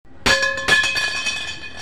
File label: Metal Pipe Drop